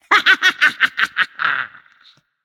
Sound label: yay; cliodhna